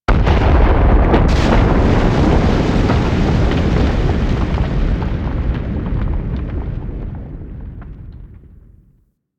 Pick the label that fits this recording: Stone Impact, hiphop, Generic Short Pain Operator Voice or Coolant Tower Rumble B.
Coolant Tower Rumble B